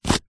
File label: wallet leather card remove